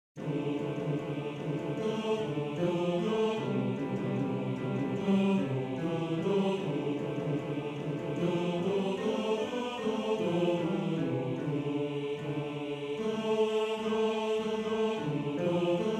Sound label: Bass 2, maennerchor